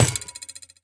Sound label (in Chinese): 三叉戟地面冲击声